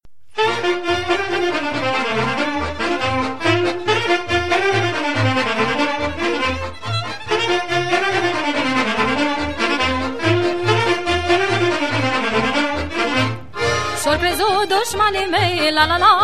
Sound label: Ardeleana